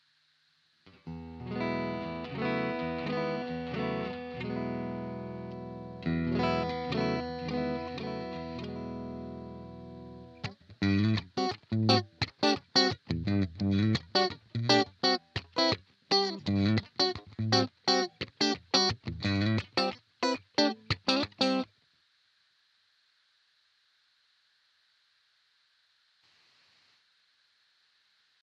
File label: EHX 5150 Clean Strat DI Out